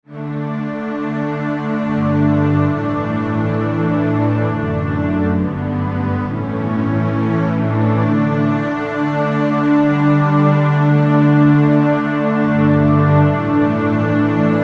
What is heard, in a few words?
Underscore PAD; Worship Flow